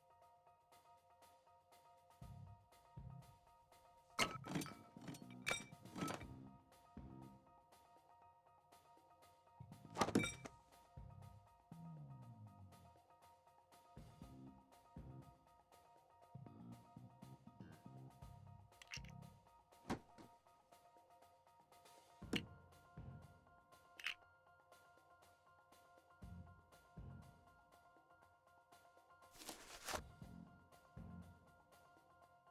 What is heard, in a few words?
Newsreport Intro; lego the incredibles 2018 switch; Cutscenes - Hubwaterfront